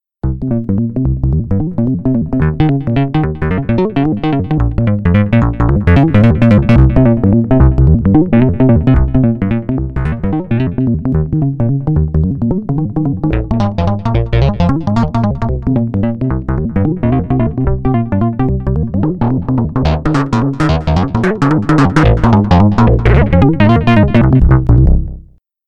funky RM bass

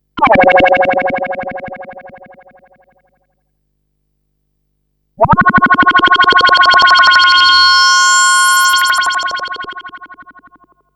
mod bubbler

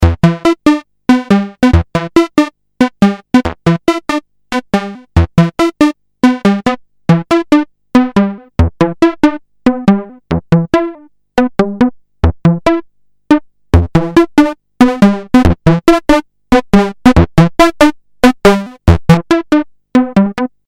variarp

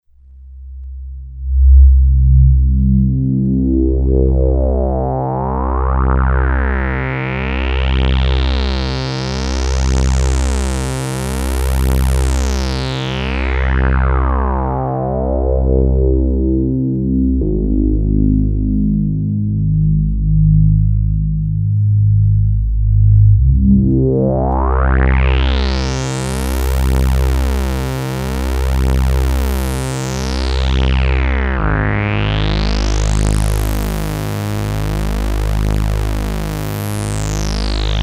basic sweep

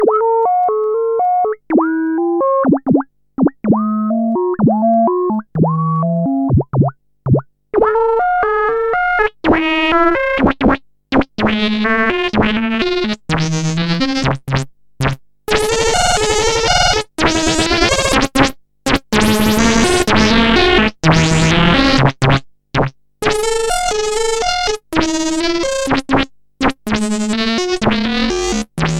Filter FM

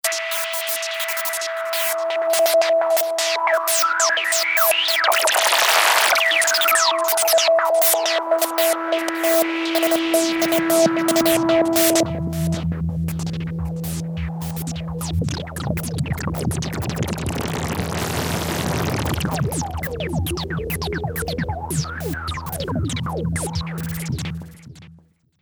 slow mods

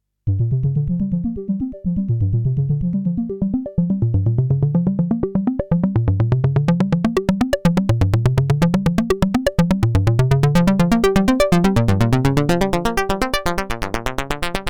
Analogue Arp Seq

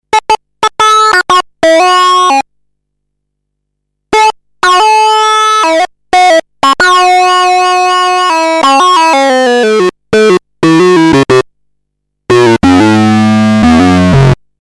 syncer

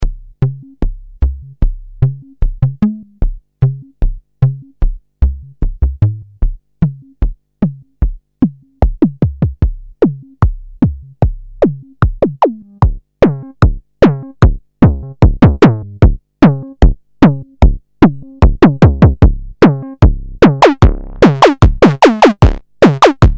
bass bd

